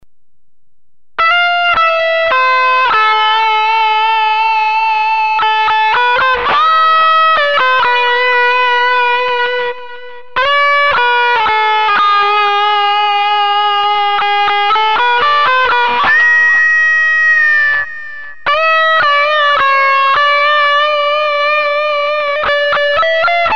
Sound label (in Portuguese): GUITARRA; Hino do Corinthians